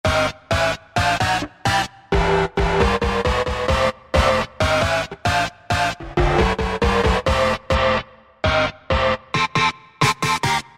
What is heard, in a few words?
Voice Hit; House; Roland SR-JV80-19 House Collection